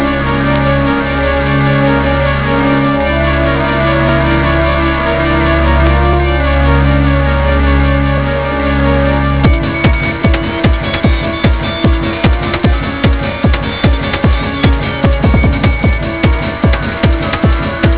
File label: ARPEGGIATORS